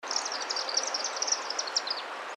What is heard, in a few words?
swift chimney; species/birds; wbba